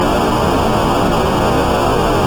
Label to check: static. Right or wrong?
Right